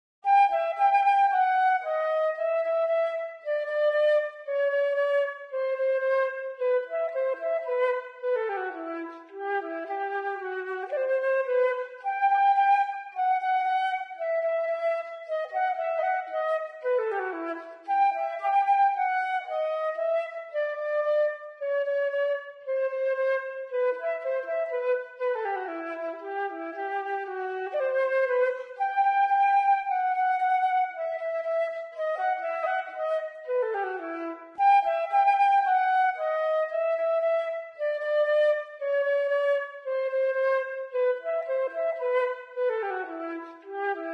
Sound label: Flute; Zomboid; Lifestyle